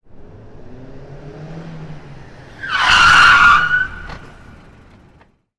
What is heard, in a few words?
CAR SKID